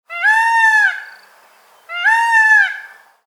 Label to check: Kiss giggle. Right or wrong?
Wrong